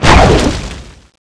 whip sword slash loop

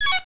laser guide complete, weapons

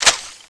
wing gun wing end

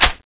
sg552 clip in, weapons; buff sg552ex

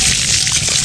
laser, sound/weapons

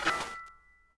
tyrant mace draw

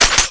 shoot start, weapons; halo gun

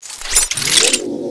void pistol draw; weapons

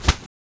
cannon dragon idle, weapons

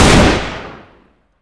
AK47 Shoot, weapons; Beast

